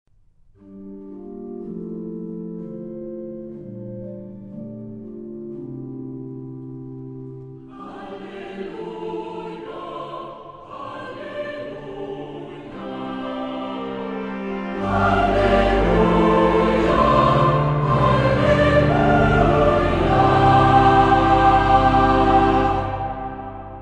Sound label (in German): Halleluja und Psalm